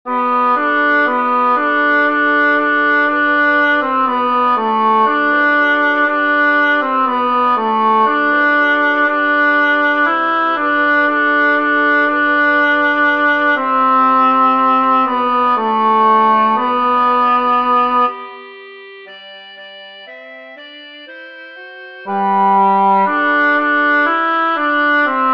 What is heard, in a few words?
tenor; Regina Caeli; Concert du 13 décembre 2024 en l'Église St Pierre - St Paul